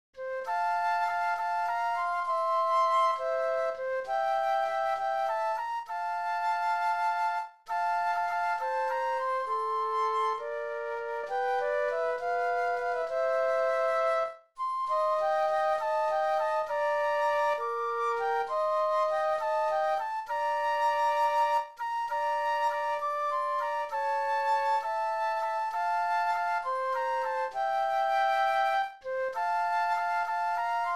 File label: Flute Duet; classical